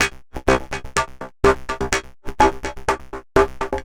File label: synth 125 mechanism C; Transmission-X